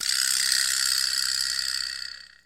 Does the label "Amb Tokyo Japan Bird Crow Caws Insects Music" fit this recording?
No